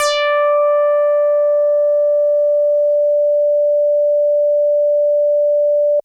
Synthe Bass DX100 D6

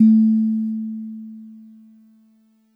DreamLike DX100 A2

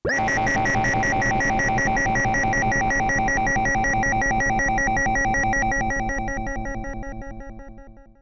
Chicken Alarm

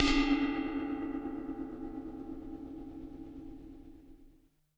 Ride Acoustic Warble

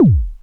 Pitch Perc Dive Vinyl Synths C3

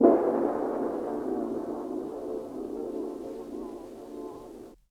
Juno106 Chord Verb Vinyl Synths C2; vinyl fx

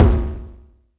BD

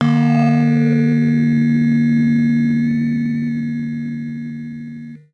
50s Synth Bell

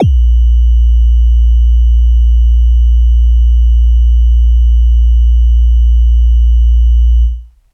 BassStation Sub Ringmod Vinyl Synths A#0, bass

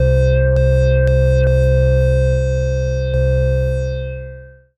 SYNTH

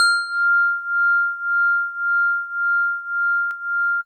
House Organ DX100 F6

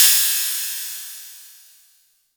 Cymbal

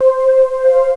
Polysix Vinyl Synths C4; Polysix Moon Moth; vinyl leads

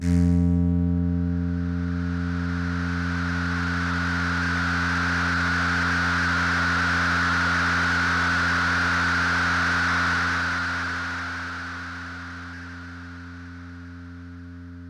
PAD Space Didgeredooo

